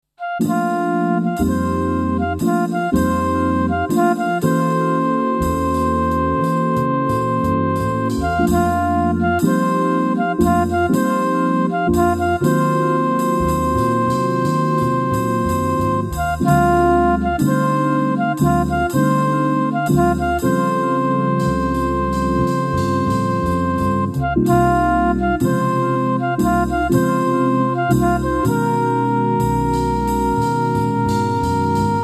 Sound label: bluebells